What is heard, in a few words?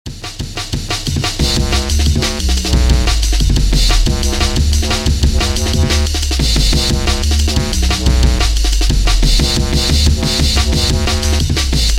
breakzzz